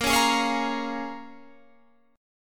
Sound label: A sharp Minor 7th, A sharp, down, Guitar, Open G